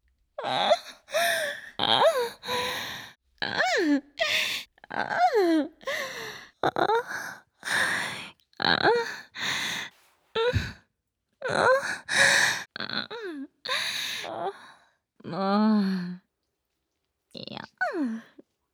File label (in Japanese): 「あぁん」集; セレネさん